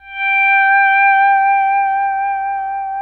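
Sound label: pedal steel swell G5; PEDSTL SWELL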